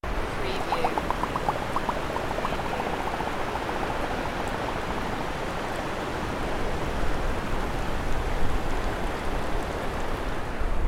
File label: Fairbourne beach sand bubbles; beanstalk audio